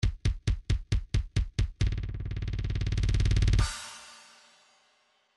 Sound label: trance midi loops fills kick off; Newtronic Trance Constuction Kit